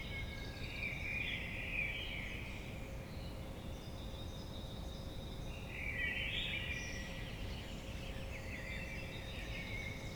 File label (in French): Merle